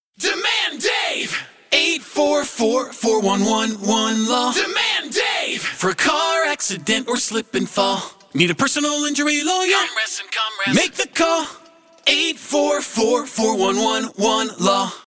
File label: Acapella